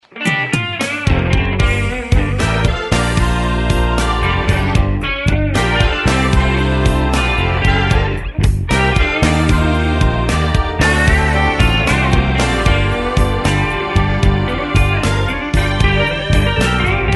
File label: blues changes